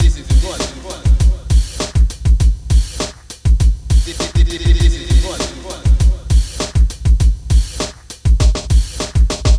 Drums vox

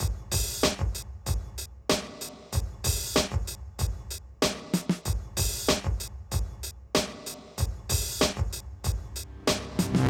Drums, 095 Cmin

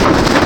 Foil; Cymatics; Foley Wavetables Vol 1; Serum

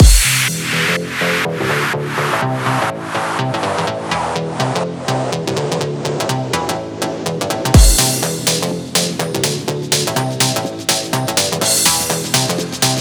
Cracker MIX 124 BPM A; Future House; Big EDM; Future House Tools; Construction Kits

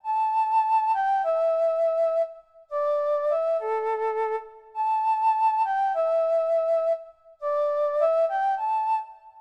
Flute, 102 Fmin

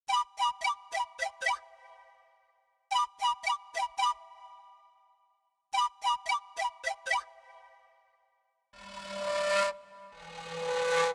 Pan Flute, 085 Bmin

